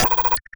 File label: FX crunch computer glitch